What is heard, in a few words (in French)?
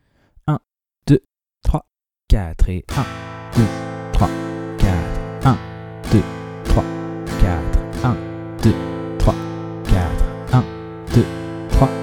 rapide travailler la rythmique; De 0 à vos premières suites d'accords